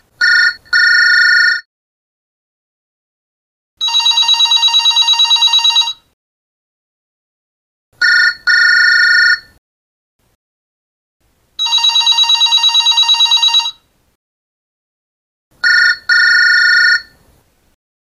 New cell Phone

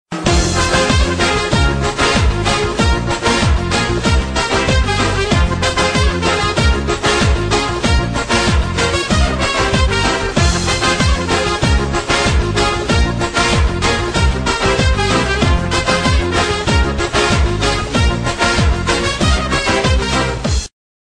Trumpet Ring Tone

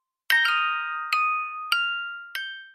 ring

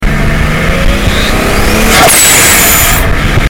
blow off valve; hip hop

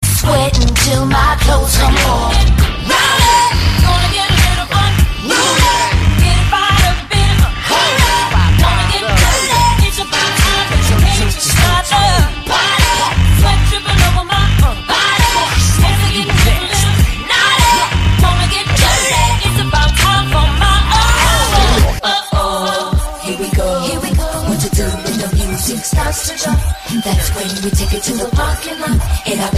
Is Calling RNB

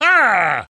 king congrats

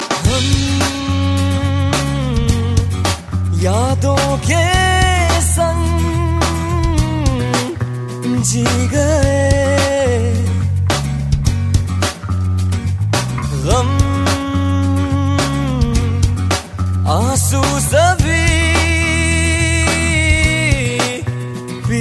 awesome hum

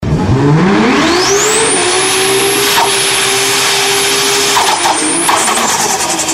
Car Turbo Charger

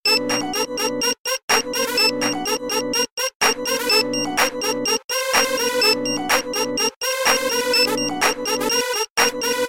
nice beep alert; ringtones; sms

